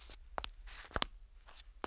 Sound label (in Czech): kroky; Mafie 1